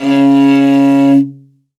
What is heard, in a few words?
MORIN KHUUR, c#2, BOWED INSTRUMENTS; World Instruments 2; PS MORIN KHUUR